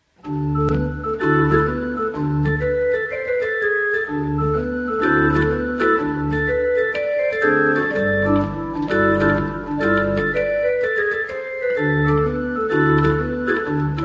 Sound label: Jazz Pop